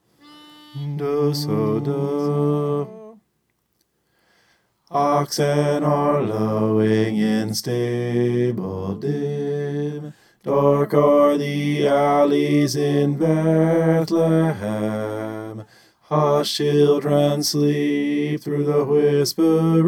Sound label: bass